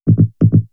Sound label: Vocoder Loop; X-Static Goldmine; VocoderLoops